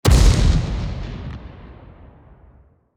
impacts; World of Tanks

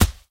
bullet dirt; guns; War Thunder

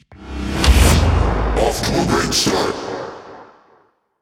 First Kill; World Of Warships

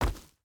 footstep hide forest jump land; Rust

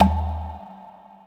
cube shoot ball

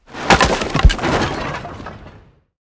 tank impact wood

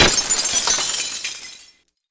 glass falling; Escape from Tarkov

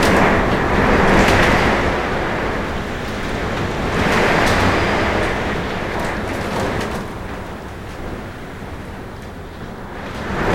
wind interior wood

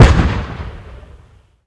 Inf Weapons; Red Orchestra 41-45

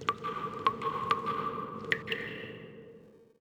cave occasional

